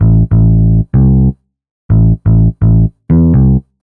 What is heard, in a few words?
DEEPHOUSE BASS 125 A